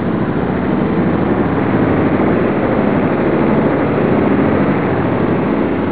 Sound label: alien noise